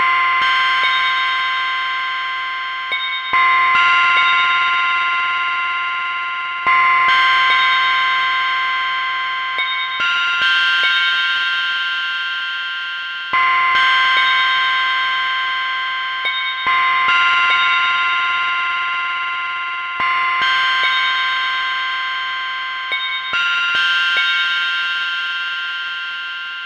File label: music